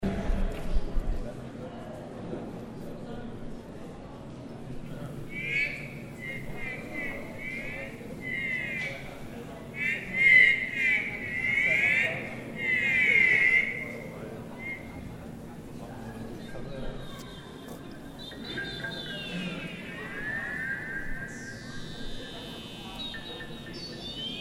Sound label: rooms full of noise